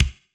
kick; pasific; Pop

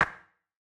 clap; Reference Beat BPM-130; Pophits

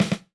Ghost; Reference beat bpm95; urban grooves; Rock

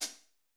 hihat; Reference Beat BPM-130; Pophits

